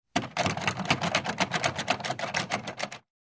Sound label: Pulling on the doorknob